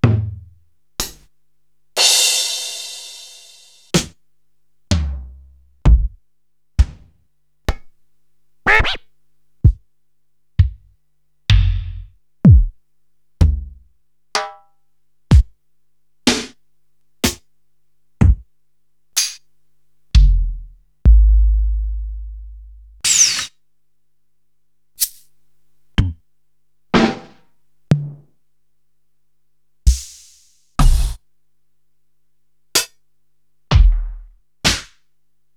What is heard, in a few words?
Korg TR-Rack, Samples Sliced; m8